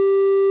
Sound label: beep